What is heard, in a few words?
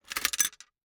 Gumball machine, metal, glass, candy, switch, mechanism, rotate, dropping, quick, wide; Eiravaein Works; Vaeyan IV